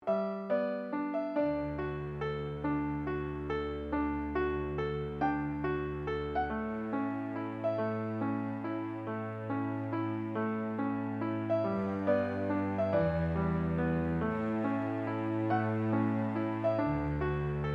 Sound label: dream melody